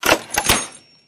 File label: metal Crate Close